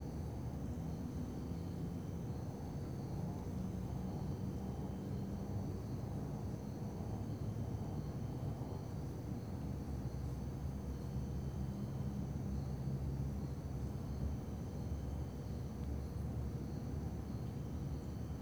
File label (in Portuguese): Ambiencia praca a noite com grilos, cigarra começa e para e passa carro; com musica; ambiencia urbana